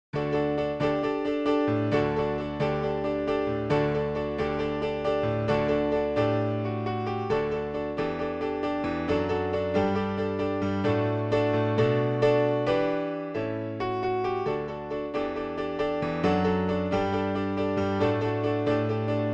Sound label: sing alleluia sing praise piano